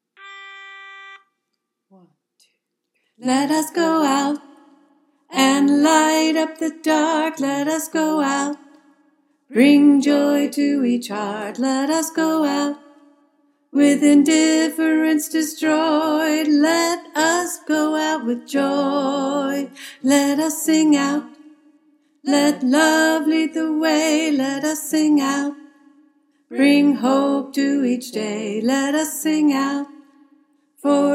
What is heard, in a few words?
alto, choir; freedom choir